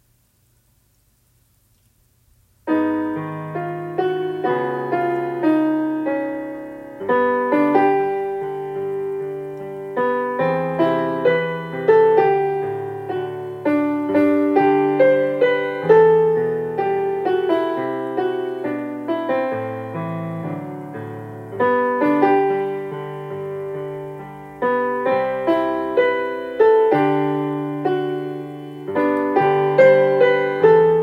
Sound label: melody